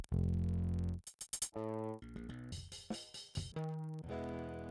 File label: Saturator; Virtual Analog